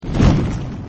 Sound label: fireball; CityHero